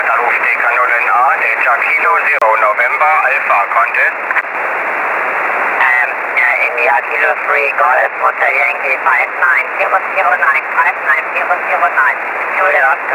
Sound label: 1296 SSB